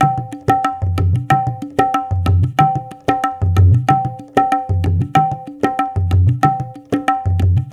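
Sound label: 93 bpm, udu; Misha Udu; Spectrasonics - Supreme Beats - World Dance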